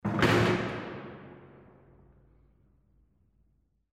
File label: Hinged closing